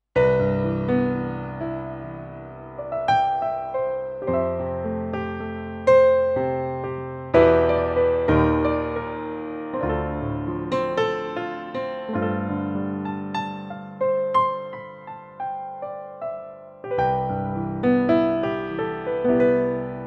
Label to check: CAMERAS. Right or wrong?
Wrong